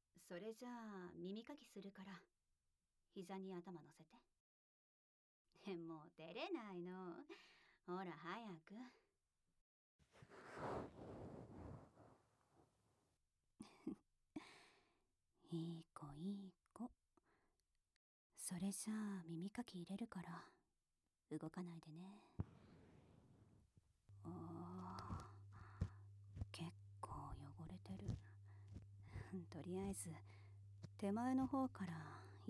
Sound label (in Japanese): 耳かきしながら; ASMR; 思い出話